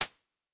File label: Hihat